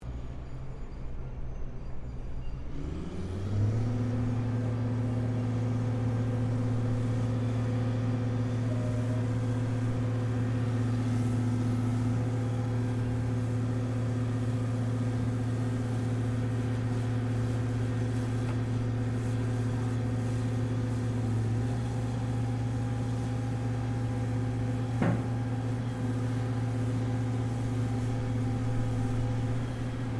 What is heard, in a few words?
Construction Pile Driver Setup Manoeuvring Close; Construction site pile driver operating